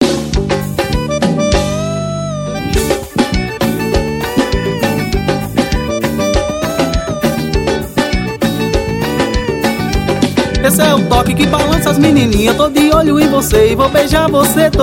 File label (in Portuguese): vocal banda novo axe solinho